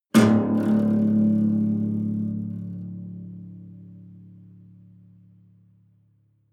low string buzz sustained

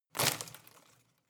home plant leaves rustle hit

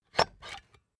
puncher squeak punch bulky

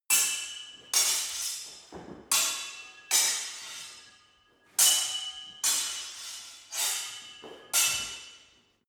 sword hit sword sequence

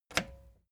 record player play push

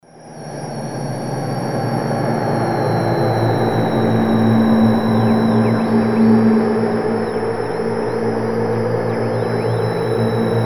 docking in space

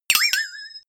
boing flexatone

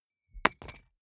key ring small drop carpet hard

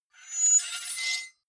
sword blade screech high

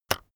keyboard external enter release heavy

